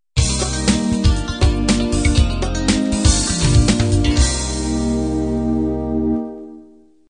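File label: standard 16 beat end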